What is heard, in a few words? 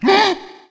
doppleganger damage